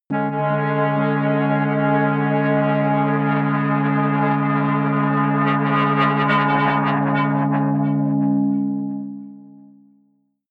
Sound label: atmospheric feedback synthesizer long high, drones; musikelemente